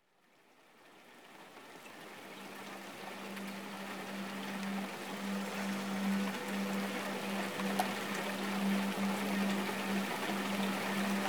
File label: Rain Drops